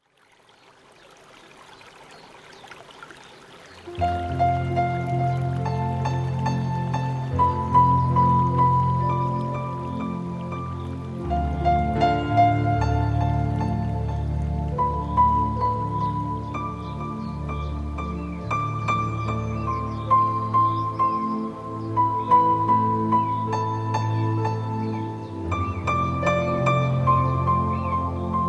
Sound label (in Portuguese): Um Piano E Um Jardim